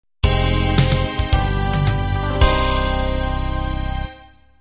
windy beat end

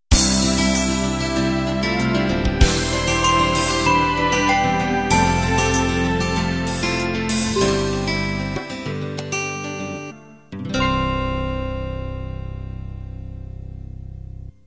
guitar ballad end

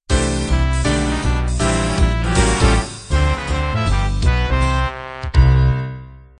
up swing end

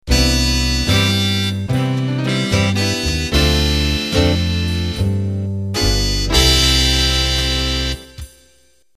Band End

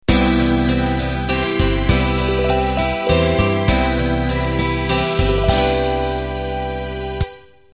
Waltz End; Band-in-a-Box

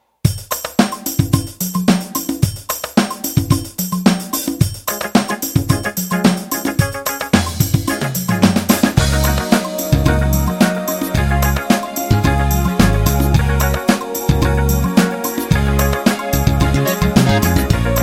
16beat

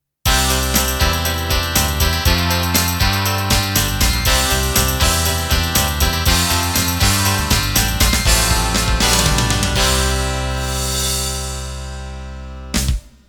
Pop Rock End

